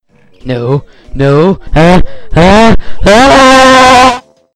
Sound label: big scream, Big Boy Scream